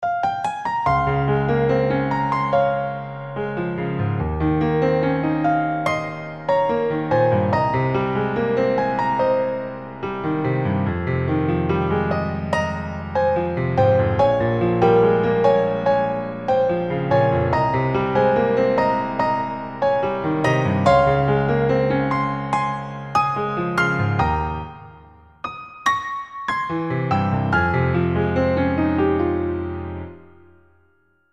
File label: piano ver.